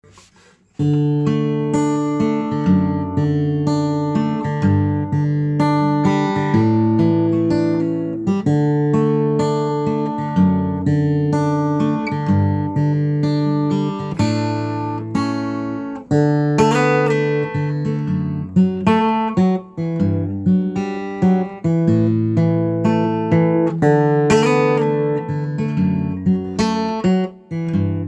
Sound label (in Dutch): Falling Leaves Akoestisch